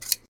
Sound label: shears; sheep; creatures